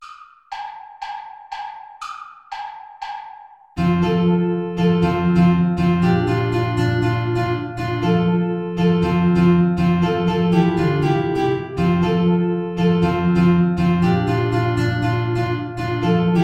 play along without guitar; traditional

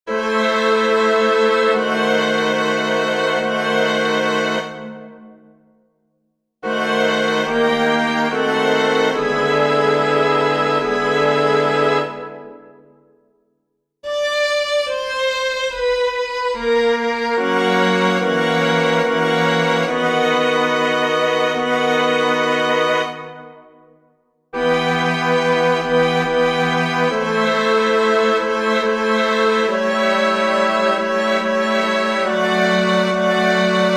qui tollis peccata mundi for winds strings

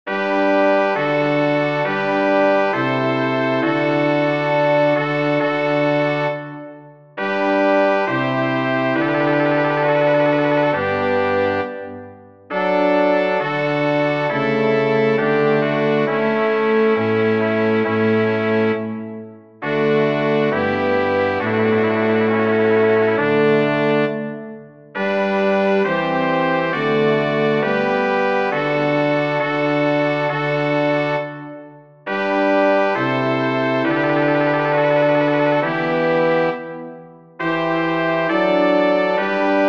choral for brass quartet; bach johann sebastian; tausendmal gedenk ich dein

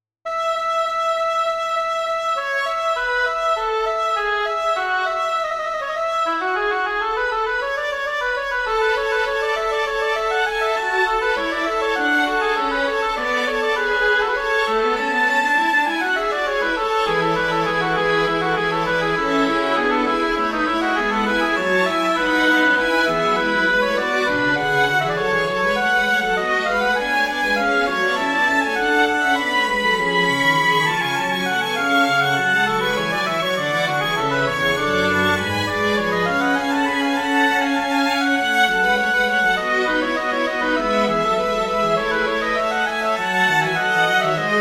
overture allegro for oboe stri; haendel georg friedrich; from the opera giulio cesare egitto

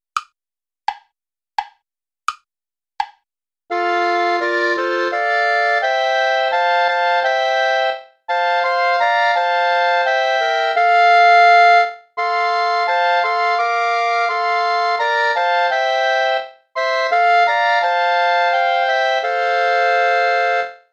play along without clarinet

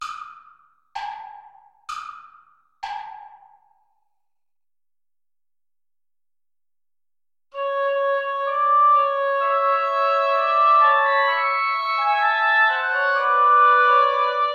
tango play along without guitar; albeniz isaac

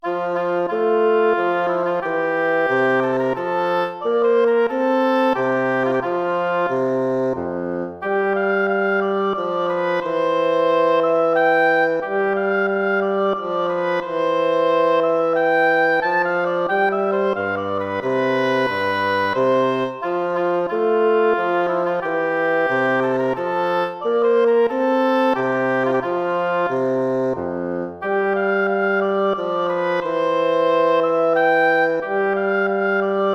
minuet; mozart wolfgang amadeus

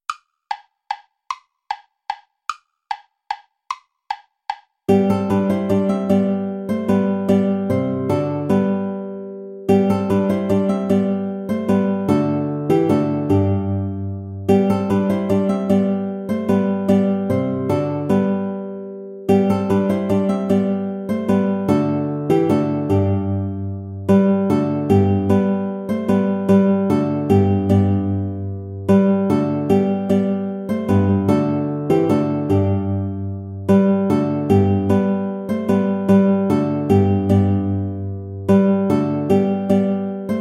volta play along without guitar; praetorius michael

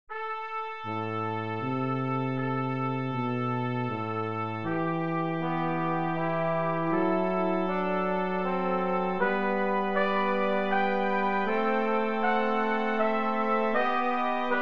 pour trompettes trombone tuba; bach johann sebastian jesus christus bwv 626

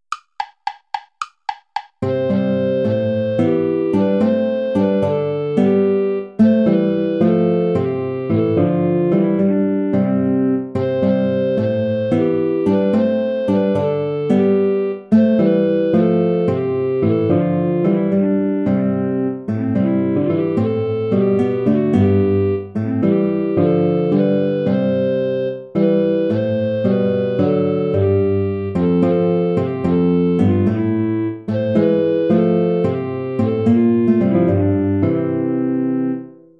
play along without guitar; hassler hans johann leo; ein feste burg ist unser gott

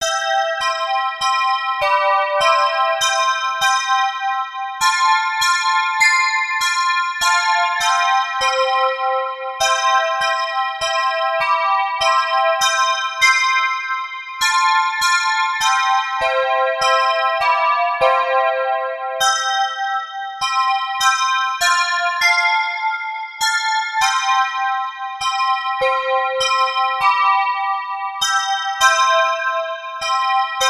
children handbells; handbells arrangments

